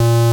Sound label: bass